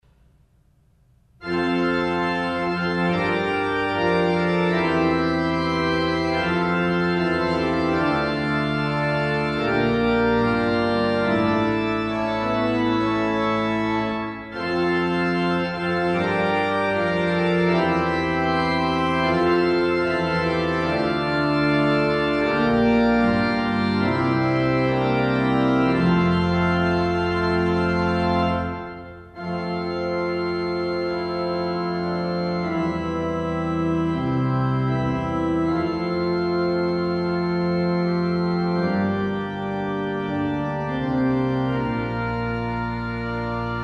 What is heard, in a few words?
Fanfare; floro